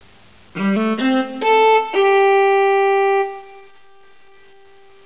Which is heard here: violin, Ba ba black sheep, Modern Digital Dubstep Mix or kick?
violin